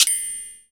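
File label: PER PROCU, Procussion, percussion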